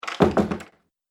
Door Shut; Door Closing

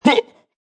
Vocal Deep Huh, Funk vocal loop sample.; 110bpm

